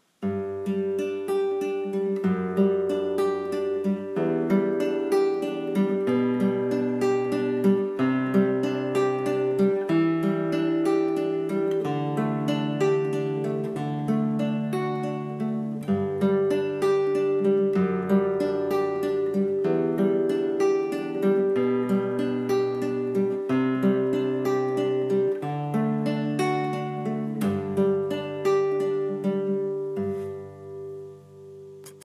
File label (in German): Akustische Gitarre; Klangproben Instrumente